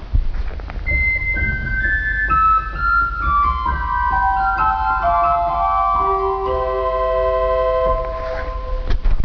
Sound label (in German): Blockfloete; Vitus; Blockfloete 2